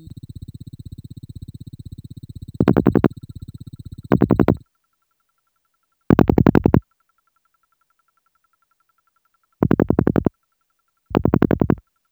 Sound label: 030bpm; elektron machinedrum